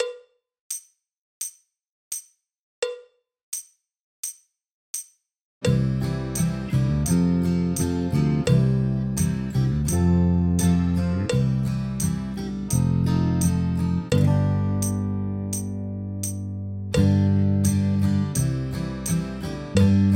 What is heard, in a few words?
Drum Kit playalong; Drums Pre-Grade Higher; backing tracks